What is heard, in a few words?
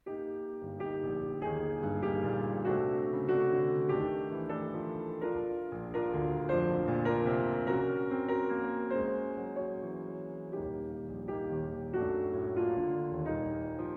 Piano Concerto; No. 3 Movement I